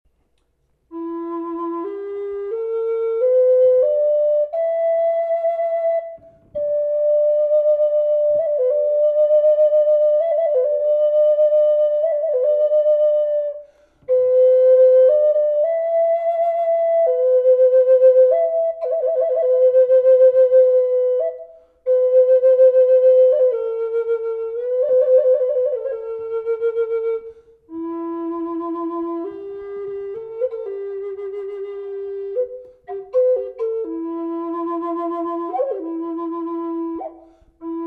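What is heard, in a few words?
reverb, flute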